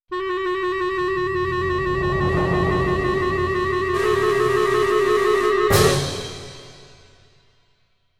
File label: circus